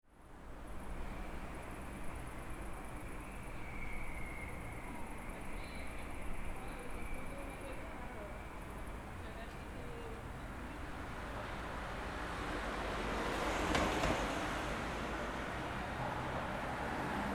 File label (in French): sifflements eoliens; sonore contexte